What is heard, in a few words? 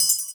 PERC L, Drum & Bass 160 BPM; Ueberschall; Drum & Bass Flash Trackz